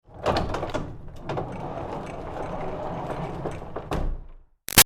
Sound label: Door Barn Rolling Lite Rattley; Barn Doors; Big Room Sound